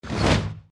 deathclaw swing; npc

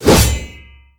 attack

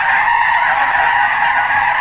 concrete skid

